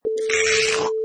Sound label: Weird Slurp